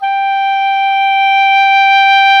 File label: WND OBOE Dry